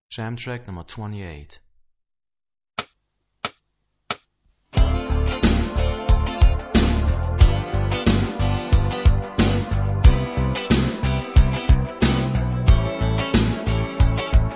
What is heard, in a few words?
Rock guitar; Rock gt Secrets